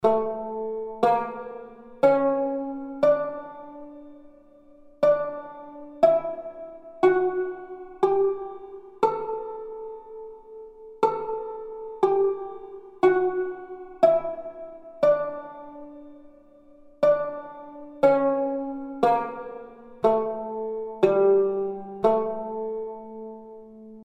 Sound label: tanbur hicaz